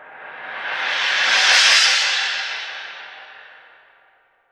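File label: SPIRAL CY, CYMBAL SWELLS; Voice Crystal - Steve Reid Definitive Percussion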